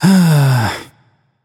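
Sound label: nonverbal sigh; voicepack; smite; merlin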